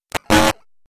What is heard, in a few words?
bonus glitch